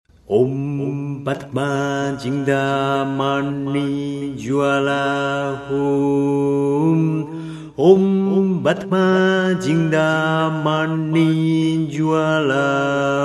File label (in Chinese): 如意轮观音 心咒 唱颂; 觀世音菩薩系列 Avalokiteśvara Series; 如意輪觀音